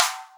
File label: Thin S, Boss DR660 sd